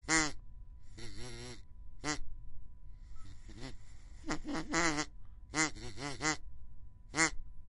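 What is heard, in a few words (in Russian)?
utka podat korotkiy zov, kryakva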